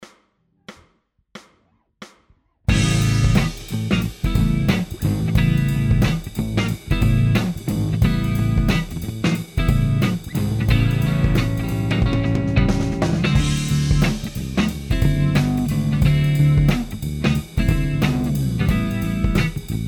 a blues

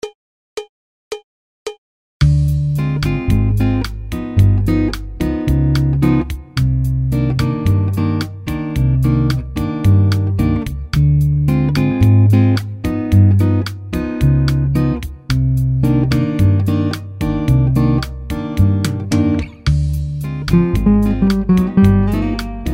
licks bossa

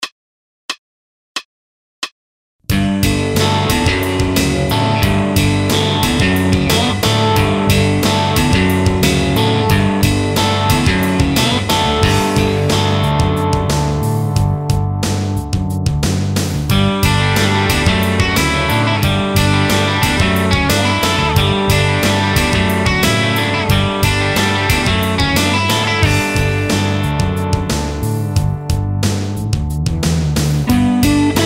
progrock; 50licks

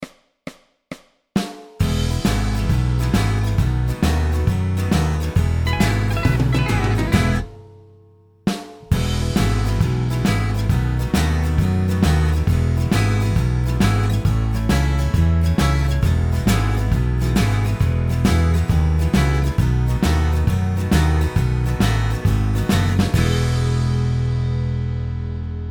sleeping solo

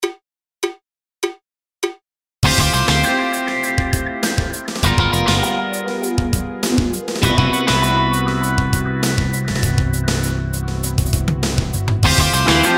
fusion; 50licks

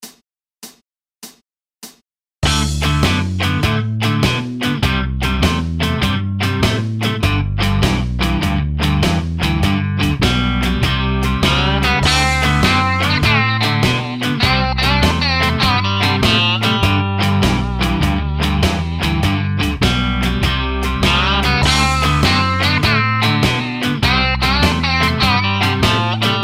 50 licks blues

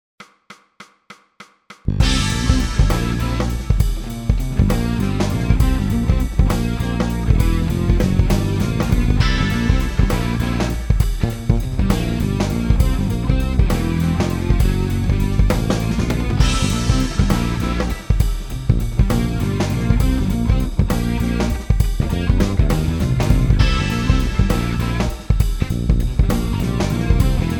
c dominant

